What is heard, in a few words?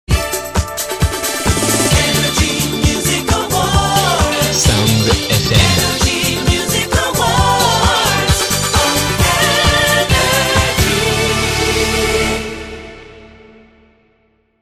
jingle; nrj